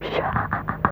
Shhha; Fairlight CMI IIe; Humans; Humans2

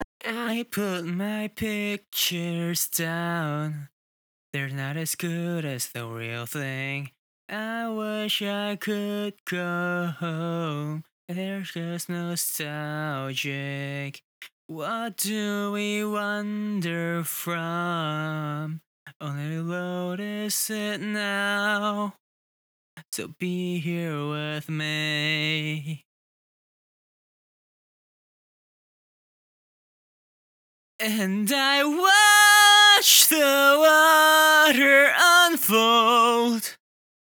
Male tone, vocal

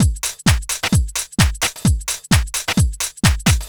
DRM 130, House, LOOPS 130; Best Service ProSamples vol.10 - House; AKAI

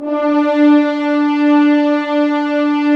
Horns; Roland - LA Composer vol. 4

